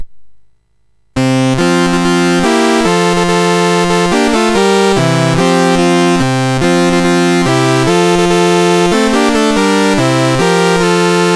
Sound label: A Christmas Medley BASIC, MOS6581R4; Latimer Joey